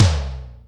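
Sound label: Room tom, Boss DR660, lt; drum machine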